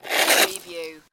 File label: Snow and Ice, Ice and snow grate with ice particles